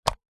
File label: household portable light switch plastic on or off; zapsplat